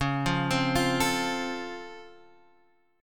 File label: Db Augmented Major 7th Db Guitar Half Step Down